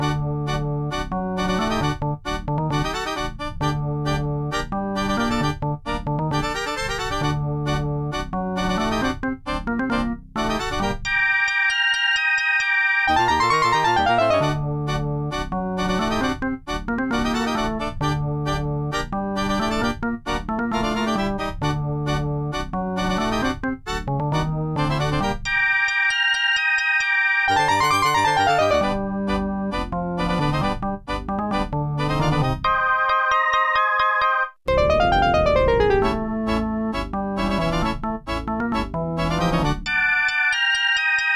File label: tango; The Music Studio; MIDI